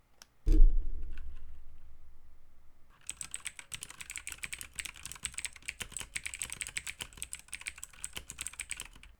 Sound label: outemu blues